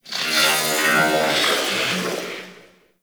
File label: Corpus Ship Metallic Robo Chatter; Warframe; Corpus Ship Remaster